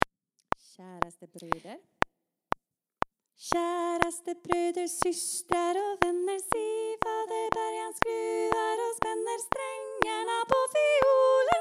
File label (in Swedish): sopran; Käraste bröder; korsang; seniorkor